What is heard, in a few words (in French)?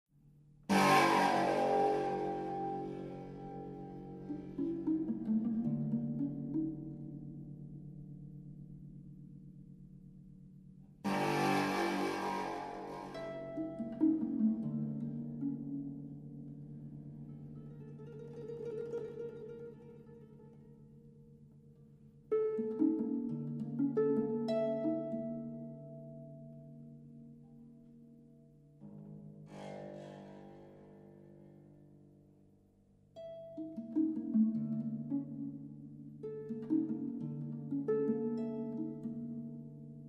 Pieces Harpe Stanza Extrait